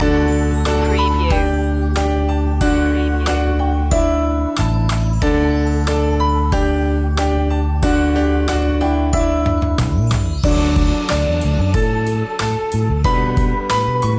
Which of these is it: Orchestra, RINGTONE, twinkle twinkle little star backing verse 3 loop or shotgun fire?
twinkle twinkle little star backing verse 3 loop